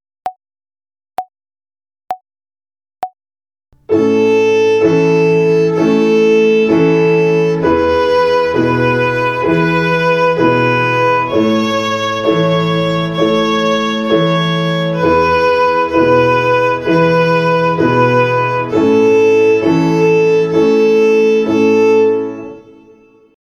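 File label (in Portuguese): Ginástica até o dedo 2 - Corda Lá - Quaternária, Violino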